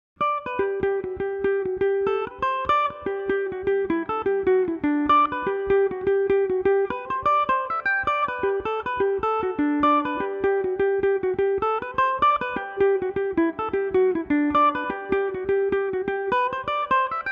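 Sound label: G major, Irish mandolin; What Call Have You To Me, Ned?; Revelation Jaguar electric mandolin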